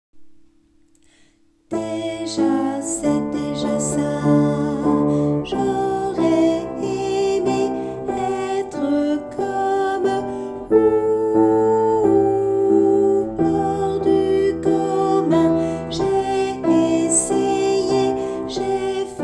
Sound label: alto